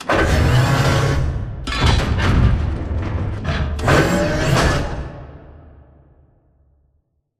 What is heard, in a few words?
Robot Arm Pass Passer Close; Boomtown; Titanfall 2